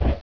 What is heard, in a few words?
body thump